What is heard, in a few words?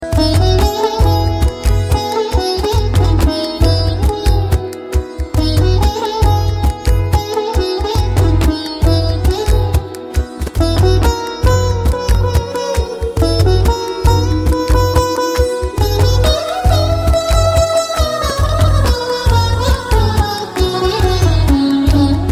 Veena